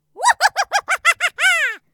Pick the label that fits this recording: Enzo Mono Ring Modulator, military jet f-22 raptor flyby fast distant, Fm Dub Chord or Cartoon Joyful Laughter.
Cartoon Joyful Laughter